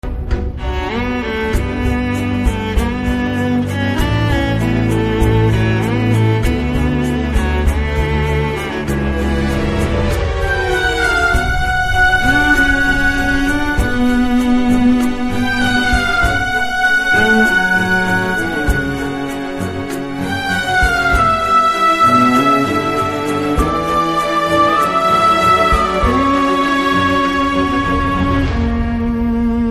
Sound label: bgm ringtone; drushyam 2